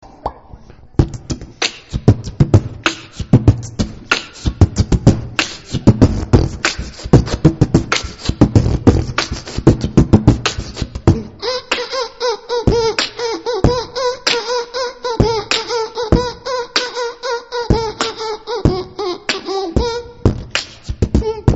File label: beatbox; kids singing